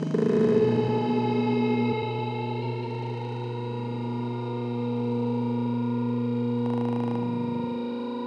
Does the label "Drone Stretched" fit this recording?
Yes